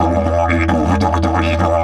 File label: E 130, didgeridoo